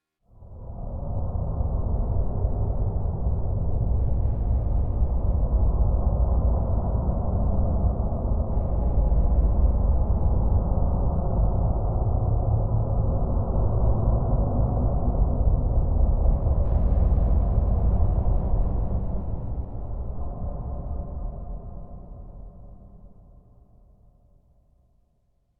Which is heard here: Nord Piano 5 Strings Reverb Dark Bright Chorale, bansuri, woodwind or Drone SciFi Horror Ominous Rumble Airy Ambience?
Drone SciFi Horror Ominous Rumble Airy Ambience